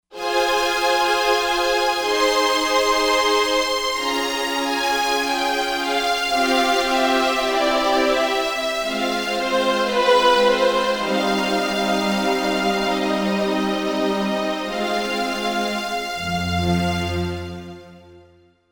String Brite String

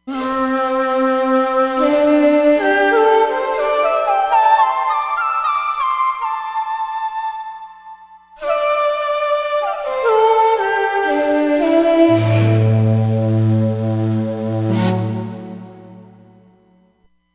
Ambi Shaku

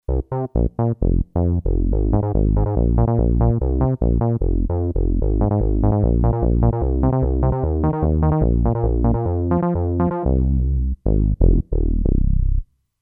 Moogy Bass, Kurzweil K2500X; Factory Programs

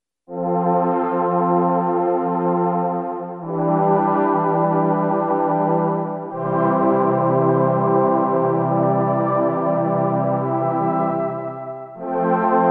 Eerie Choir, Oberheim OB-8

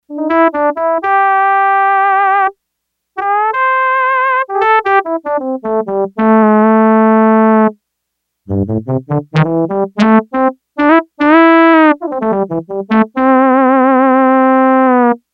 Brass, Yamaha TX802; Factory Patches; Voices; SilvaBrass

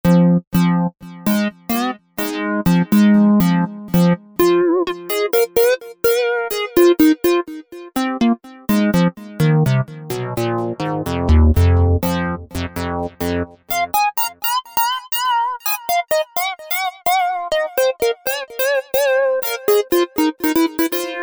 synth leads; Blip Side; Apple GarageBand 2

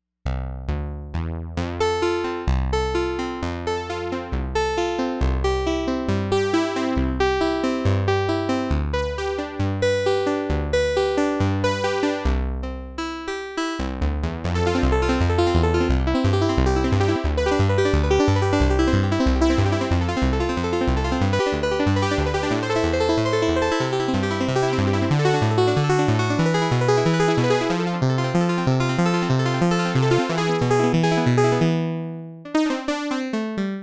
Tinsel Banjo; synthesizer; Guess the synthesizer game

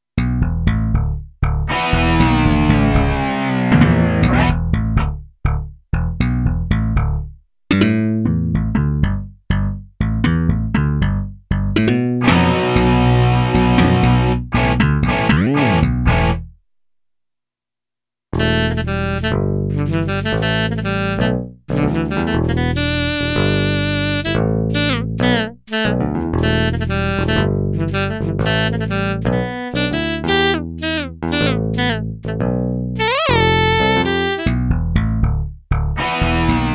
Rck Guit 5th Solo Rk Guit Elec Bass; Rck Guit 5th Solo Rk Guit Elec Bass I demo song

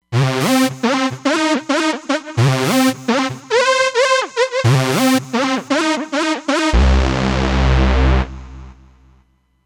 Legato Tkno, Roland Fantom XR

